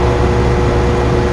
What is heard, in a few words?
lights